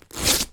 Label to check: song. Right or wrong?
Wrong